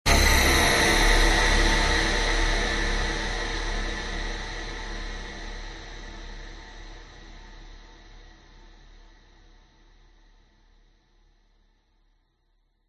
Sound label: horror hit scary dark jump scare piano stab screaming high pitched shrill